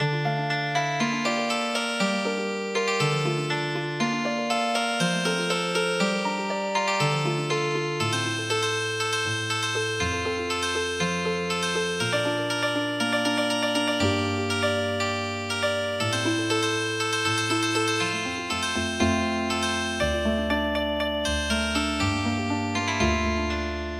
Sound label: Healing Harp; for canary